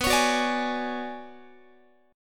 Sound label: B Minor 6th Add 9th B, Guitar; Bm6add9 strummed